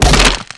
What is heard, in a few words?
antlion guard shell crack; npc; Half Life 2; Episode 2